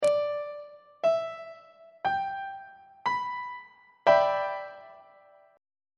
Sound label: f double sharp major 6th 2nd inversion on treble clef